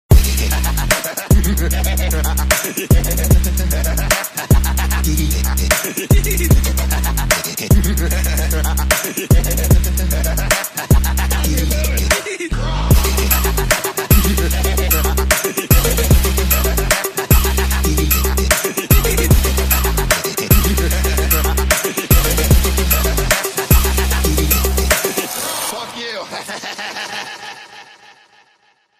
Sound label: Hehehe; Plz Stop